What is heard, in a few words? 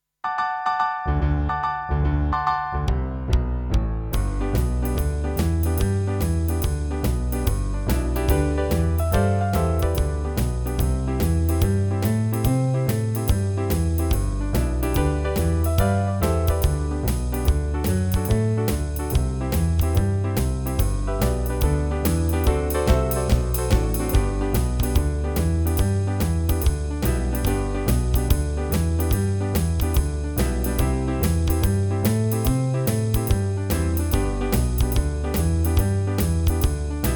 Boogie Backing